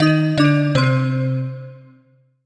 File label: Bell Voice Negative; MUSIC EFFECT; startide